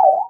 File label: Beep